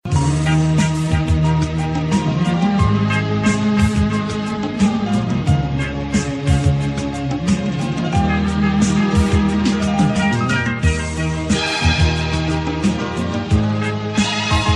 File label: arabic night sound